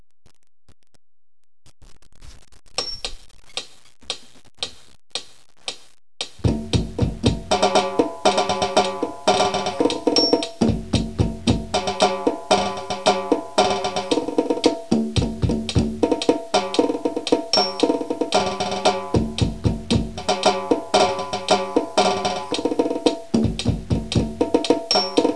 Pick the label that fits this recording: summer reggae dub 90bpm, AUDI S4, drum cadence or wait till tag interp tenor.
drum cadence